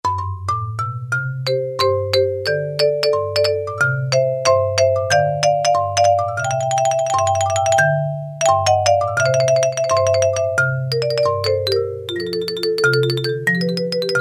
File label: Marimba, Roland U-110; Factory Patch